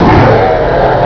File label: pain